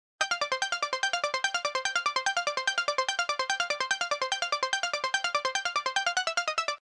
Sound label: arp C; 次の展開0’54～のアルペジオシンセは; Chorusをあて、キラキラさせ手弾きしています。; Synth Leads; K-work Lead